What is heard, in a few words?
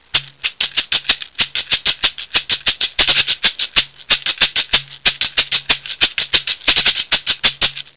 shekere; percuss